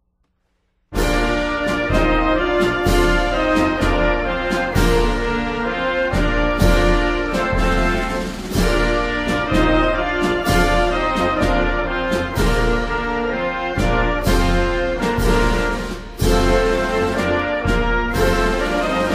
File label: Ode to Joy (Instrumental)